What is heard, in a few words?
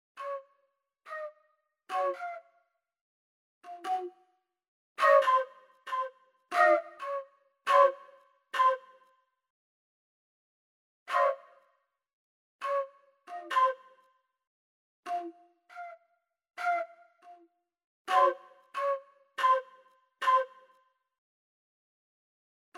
disco